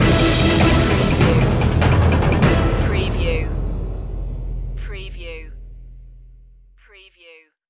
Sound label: take action stinger